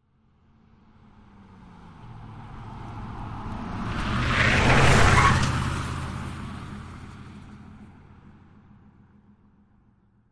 Wagoneer: Fast Corner By, With Tire Skids, Jeep Wagoneer